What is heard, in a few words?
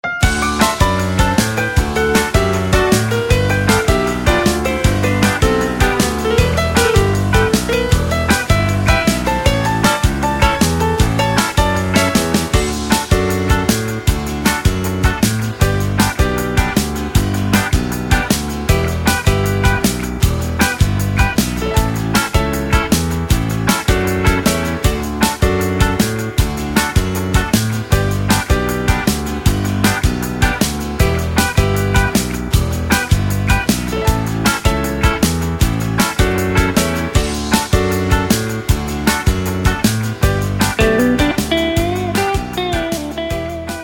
(D) No Backing Vocals